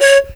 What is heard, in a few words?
PIPE C#4, REED PIPE; Africa; Spectrasonics; Heart of Africa 1